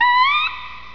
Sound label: Riff; The Cruncher 1.1 Demo; Help Wanted